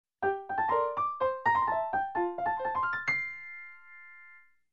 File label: Elegant and Cute Piano Transition